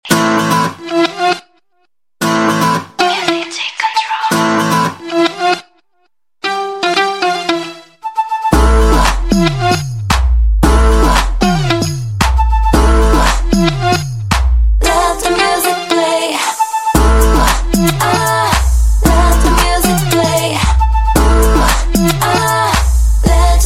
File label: KICK MUSIC